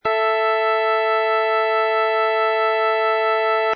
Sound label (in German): Quinte; werckmeister - stimmung